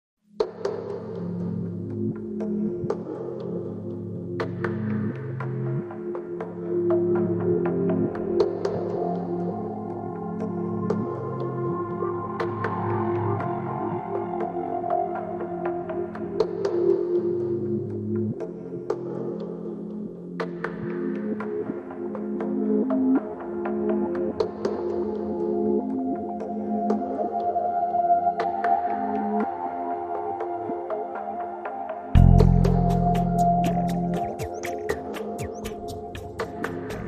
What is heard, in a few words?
Pulsating Light Carpet